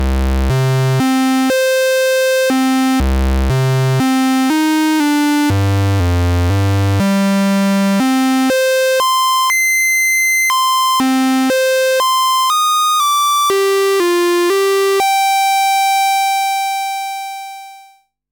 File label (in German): Model 15 Rechteck offenes Filter